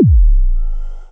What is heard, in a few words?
scatter Boost; netent